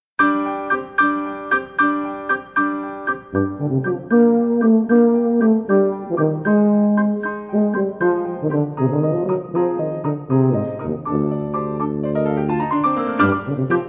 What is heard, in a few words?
Tuba Sonata